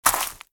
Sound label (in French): pas feuilles